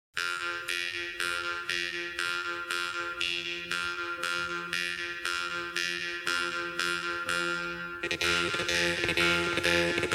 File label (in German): maultrommelduo